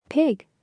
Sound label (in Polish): pig